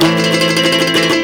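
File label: OCT GUIT MEL, OCT GUIT MELODY; Best Service; World Colours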